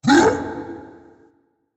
grunt, firegiant; smite

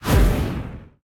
projectile; smite; raijin

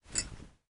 armor; smite; osiris

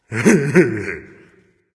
heh heh, voice; Smite; ymir skin12

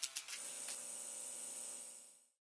Landing Light; Pajamas; smite; kumbhakarna

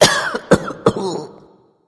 clear throat; smite; voicepack; ra

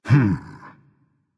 thinking berserker; cuchulainn

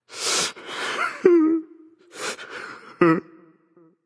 boohoo

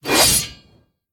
swing; smite; god arthur

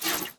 arrow flyby; smite; ahpuch skin10

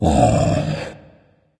sigh dragon; fafnir

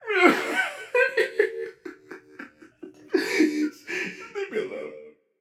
boohoo, voicepack; smite; jorm

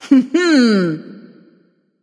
hehheh; smite; pele

